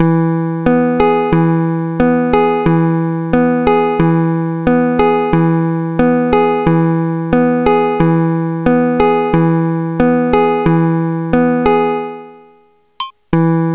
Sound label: guitar synth; Master Tracks Pro